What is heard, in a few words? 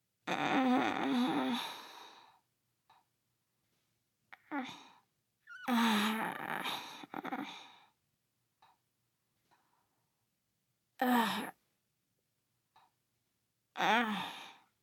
young boy groans; splicesound; Don't Be Afraid The First Toy